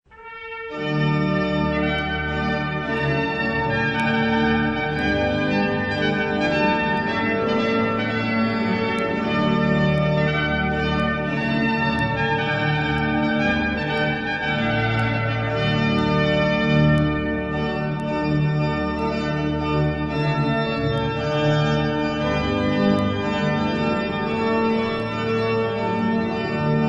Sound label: organ trumpet; charpentier; Te Deum prelude